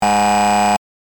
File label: buzzer